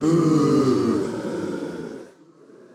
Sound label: Crowd Booing; Pitch Black Audio Pong